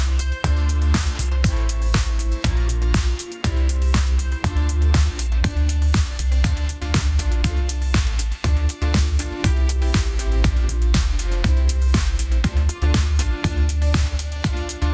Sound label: rising sun pop groove; ai